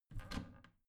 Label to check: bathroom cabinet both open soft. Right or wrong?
Right